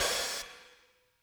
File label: HIHAT OPEN, ELECTRO KIT